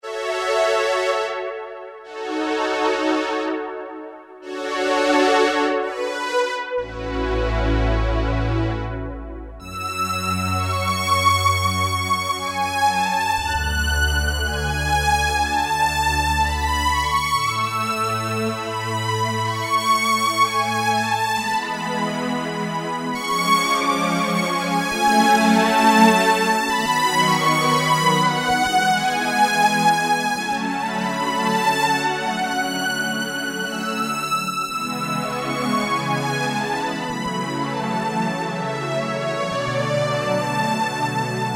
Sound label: Reverb Strings, Roland MKS-70